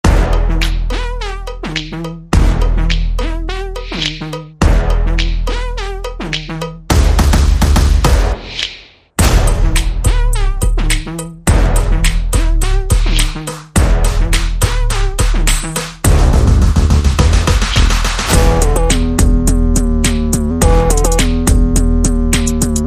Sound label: No Saxophone